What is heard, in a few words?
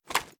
raise first up, reloads; gmod